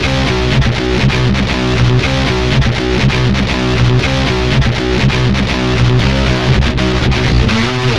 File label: Guitar Riff Heavy